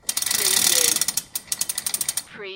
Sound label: Chain Through Pulley Fast Speed